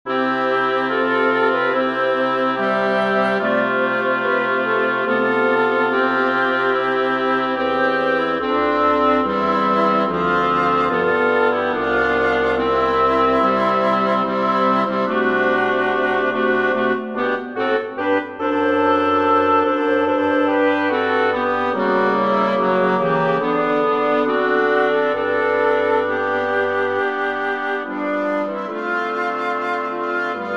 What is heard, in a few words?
andante for woodwind quartet; mendelssohn bartholdy felix; from lieder ohne worte